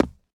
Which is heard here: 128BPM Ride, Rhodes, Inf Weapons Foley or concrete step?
concrete step